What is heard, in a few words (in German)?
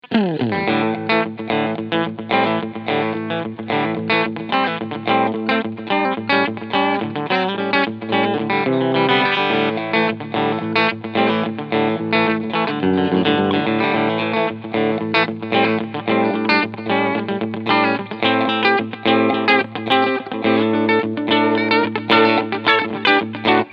Steg PU Clean